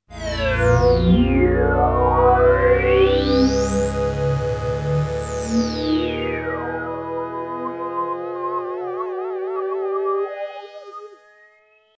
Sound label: glide